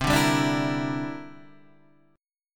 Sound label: G Minor 6th C; Gm6/C strummed; JGuitar